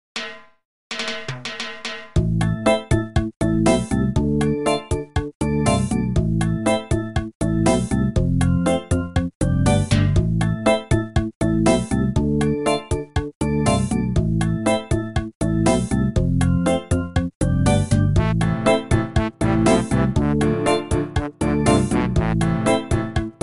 Reggae

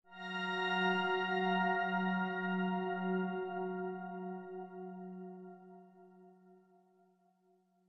scaffolding

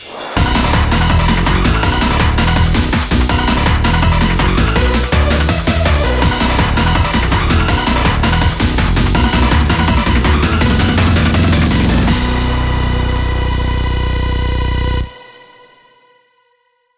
opening bgm; Beatstream Animtribe 2015

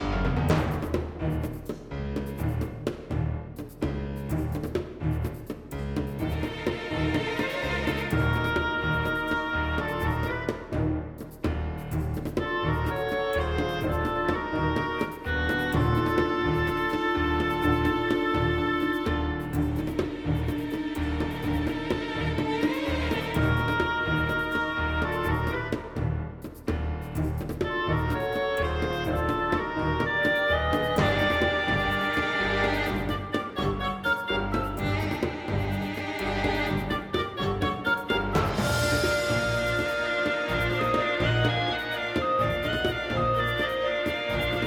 Ingame Cave; code shifter

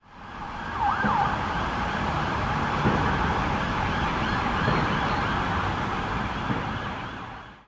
Sirens

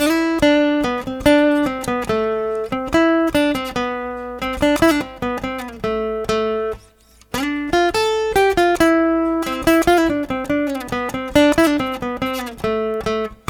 Loop Russe; carte blanche for a fistful of teeth; windows gamerip 2006

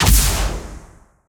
Gunartl Rocket Launcher Fire

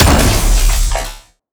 Revolver Charged

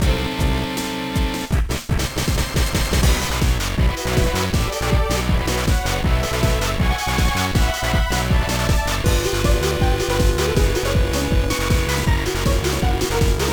BGM; Tantei Gakuen Q Kyuukyoku Trick ni Idome 2004 GBA

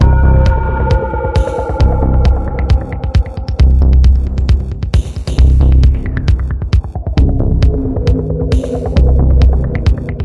marked loop

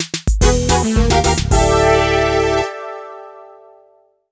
Golf - OB; Super Monkey Ball 2

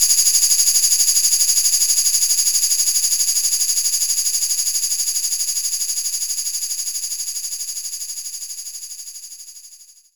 Maracas

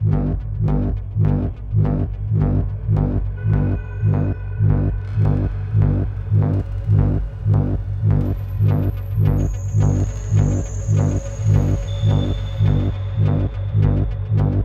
Music Tension Pulse Med Tempo; Battlefield 3

